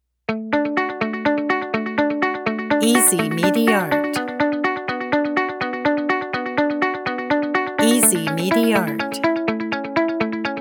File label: inspiring day